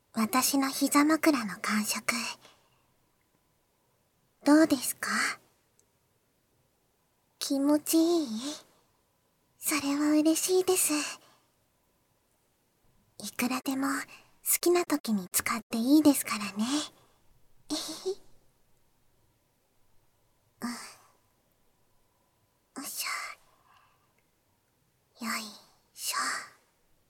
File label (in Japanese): 耳マッサージ（バイノーラルマイク 効果音あり大きめ）, ASMR; バイノーラルマイク収録 効果音あり; 効果音大差分